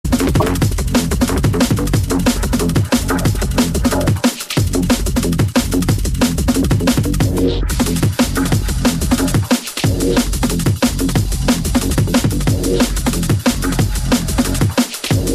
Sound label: Drum and Bass Jungle, UK Bass Radio